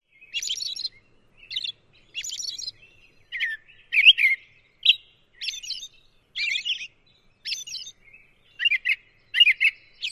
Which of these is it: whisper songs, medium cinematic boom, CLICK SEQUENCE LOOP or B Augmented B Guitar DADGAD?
whisper songs